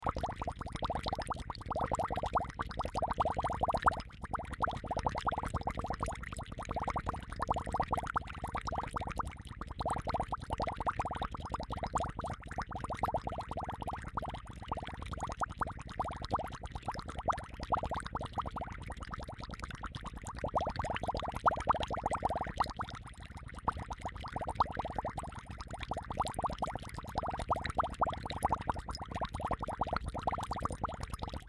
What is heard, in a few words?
water bubble